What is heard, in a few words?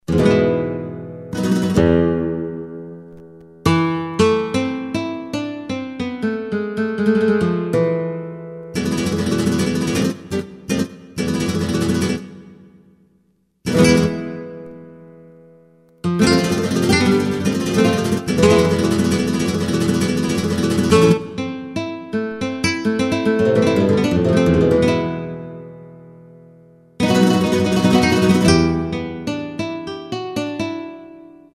Flamenco & Gtr, Roland SRX-03 Studio SRX